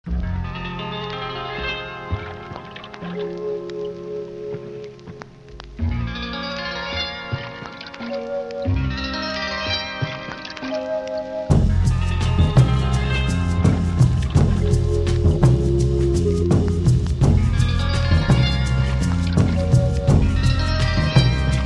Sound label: sandpaper